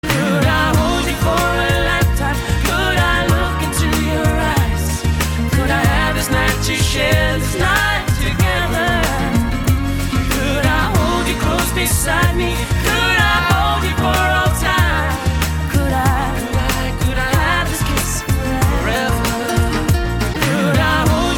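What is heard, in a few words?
rhythmic retro melody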